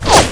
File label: whizz, bullets